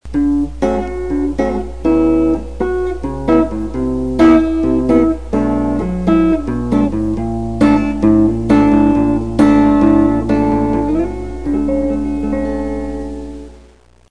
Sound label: necknotone